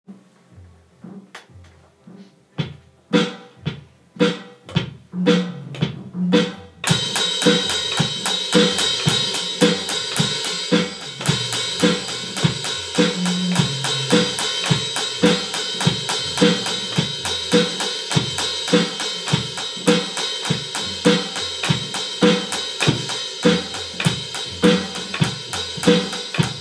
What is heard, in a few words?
drums